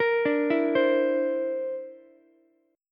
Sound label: D 7th Suspended 2nd Sharp 5th, Ukulele; 15,14,12,15; arpeggiated; JGuitar